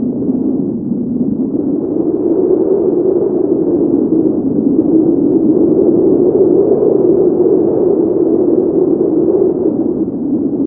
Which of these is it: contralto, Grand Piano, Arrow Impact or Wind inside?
Wind inside